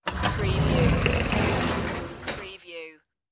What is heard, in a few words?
sliding door open